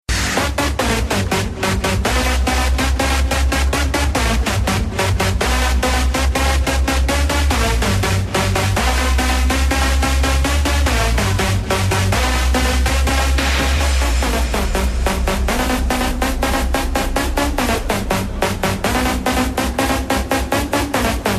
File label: good trance, ringtones